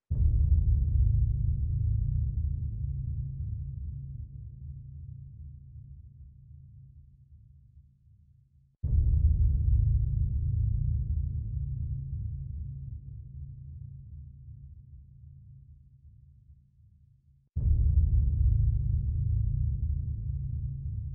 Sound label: Drums Mix; Anger Music Library